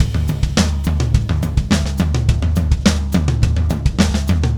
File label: Beat Crash; Session 02 - Clinical